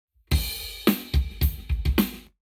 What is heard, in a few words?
Lab Wet Drums 0deg